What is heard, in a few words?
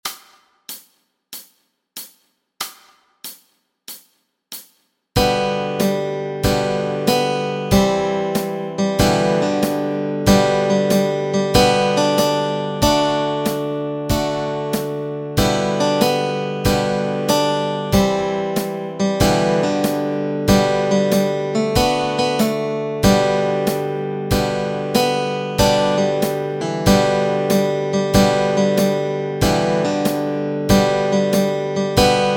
Swing Low Sweet Chariot melody and chords